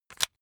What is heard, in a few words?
razor rickety fold up hard